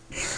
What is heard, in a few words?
move, chess; Chess3D